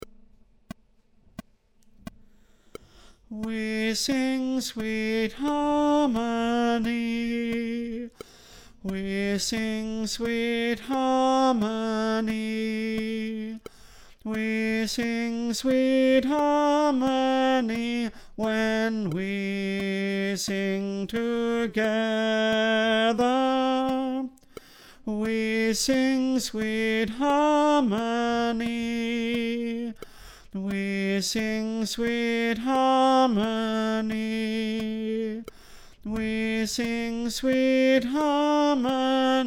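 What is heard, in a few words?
tenor, choir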